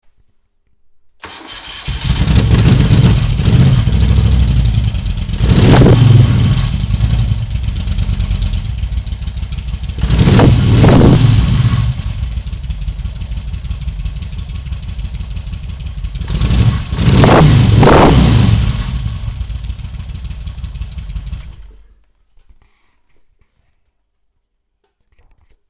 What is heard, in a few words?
moto guzzi 850 T3